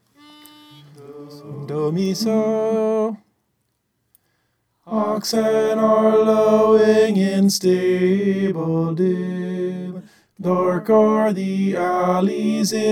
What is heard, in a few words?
tenor